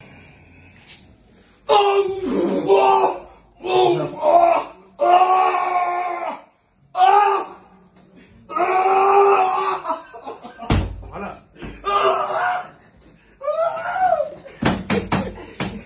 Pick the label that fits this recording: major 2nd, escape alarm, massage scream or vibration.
massage scream